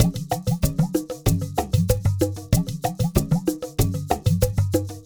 Ensemble, Latin Percussion

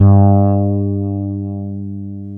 FRETLESS G2, BASS; Northstar - New Gold VOL-2

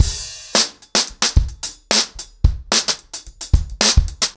110BPM, acoustic drums, drumloops

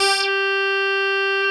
SYN G5; Best Service Gigapack 1

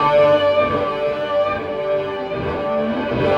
Yell, Electric Guitar; Sonic Foundry (Sony Creative Software); Friendly Fire; Wrath

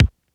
BD Punch Hi, Bass Drum; Lost Tapes From Mars

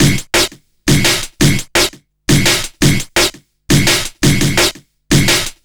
Hardloop, Drum & Bass; Fluid Dynamics

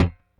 Rickenbacker Bass; Airwindows; Rick-Xtras

